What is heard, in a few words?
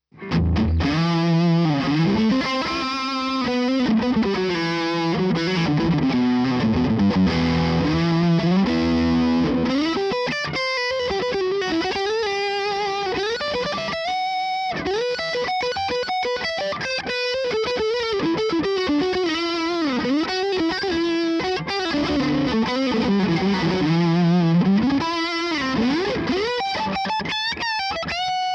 Gain HB